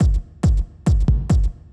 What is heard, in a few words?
Dark Industrial Loop Intro 139bpm